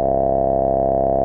MOOG C2, Synthesizer; Hollywood Edge; Giorgio Moroder Rare Synthesizer Collection; MLTI.MOOG

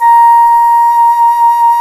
FLT C FLT, C Flt Brt

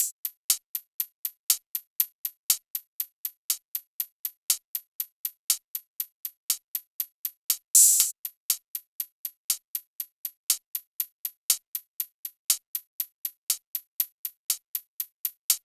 Hi-Hat, EDM; Sonic Foundry (Sony Creative Software); Afterhours EDM; Chillout Service

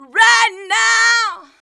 VOX, Singin

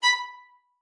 B5, VIOLIN SPICC; Xsample VOL-5

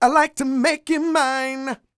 SING, Vocal Planet R&B & Dance; ILIO

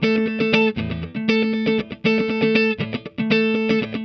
METAROCK; Sonic Foundry (Sony Creative Software); Drums from the Big Room The Mixes; Demo Projects

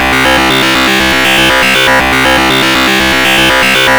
GRUNGE

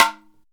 EMELABO HITS; Best Service; World Colours

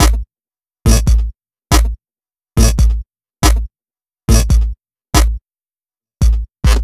Glitch Beat; Trip-Hop

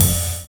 SUSPENS; Univers Sons

